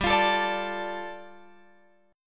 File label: G 7th Suspended 2nd G, Guitar Open F; G7sus2 strummed